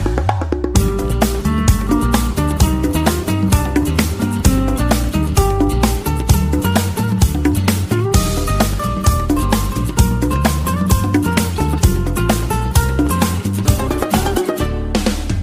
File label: Theme Telugu Ringtone